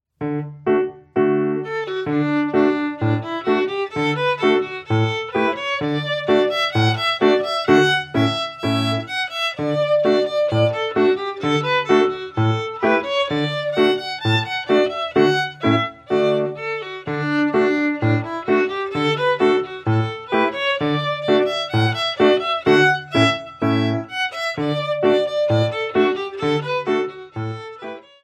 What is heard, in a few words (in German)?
irisches fiddle lied; hoerversion